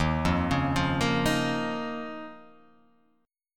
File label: D sharp 7th Suspended 2nd